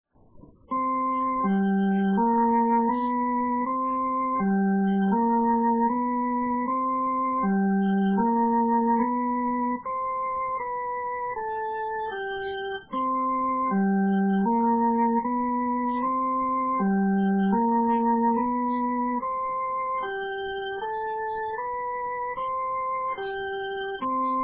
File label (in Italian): Accompagnamento SUSSURRI E GRIDA